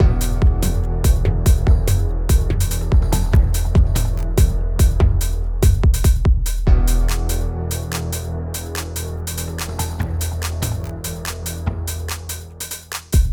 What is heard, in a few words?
Music Gameplay State Tension; Music Hideout2; H2 New Level Area=Balcony